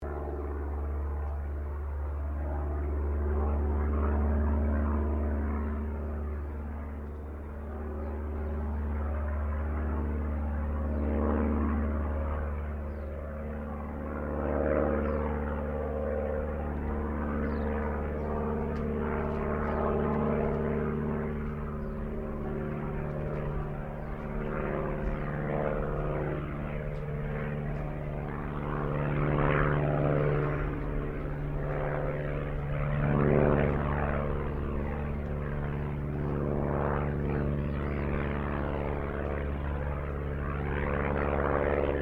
AIRCRAFT DH82A DEHAVILAND TIGERMOTH FLYING DISTANT; 1942 Tigermoth; Airplane; Vehicles; Aircraft Collection